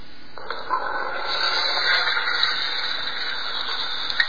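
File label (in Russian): звук дыхания верблюда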